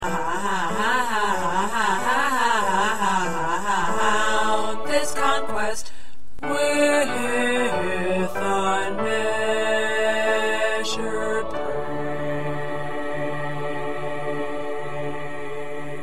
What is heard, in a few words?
2nd Bass